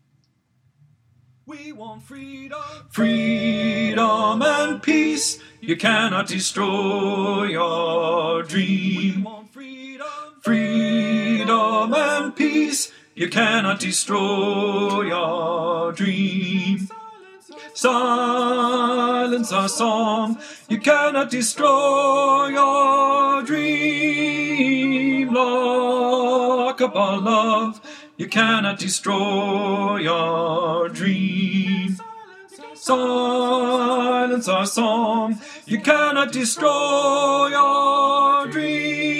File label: Freedom and Peace Tenor